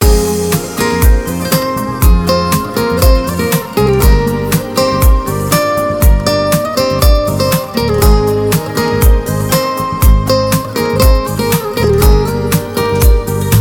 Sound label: Ringtone